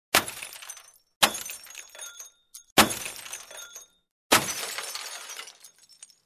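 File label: zvuki stekla, glass sounds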